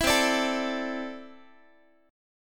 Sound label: C 6th Add 9th C down Guitar; C6add9 strummed; 19,20,17,19,x,x